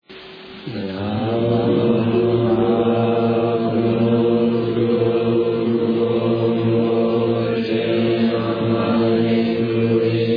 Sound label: end chant